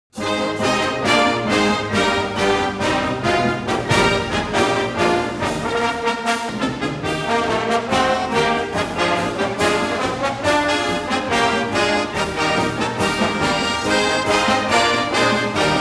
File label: fight song